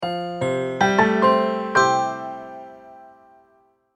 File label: multimedia logo, Musical Identity/Signature